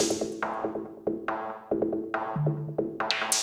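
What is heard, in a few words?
PERCUSSION ELECTRO 140, Techno Drum; Techno2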